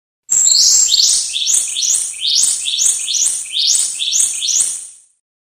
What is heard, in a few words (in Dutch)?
Gele Bek Swaay